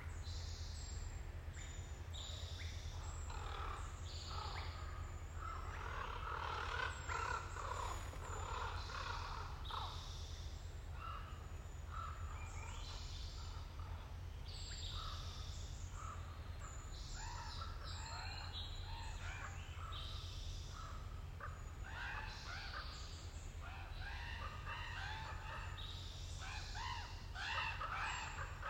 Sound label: Forest Sound; sunderlandlab forestry ubc